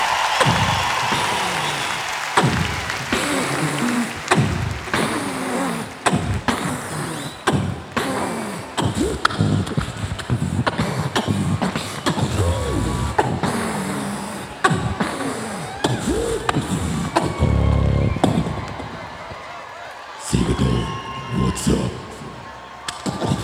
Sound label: Beatbox